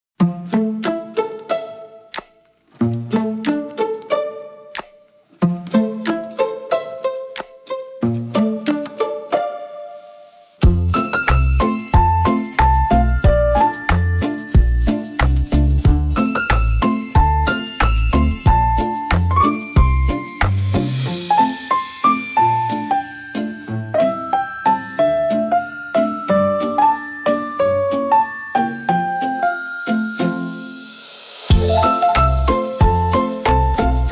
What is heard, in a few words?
kitchen concert, bgm